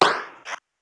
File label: dw popp